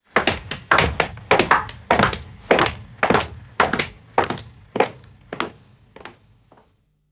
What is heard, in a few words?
fast footsteps down stone stairs fading; foley